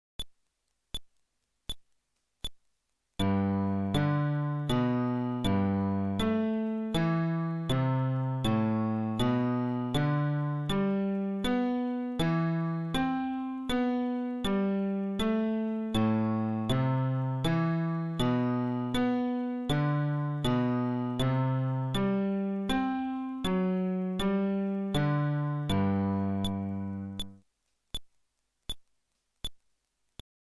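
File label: Arpeggio テンポ80, フレットレス bass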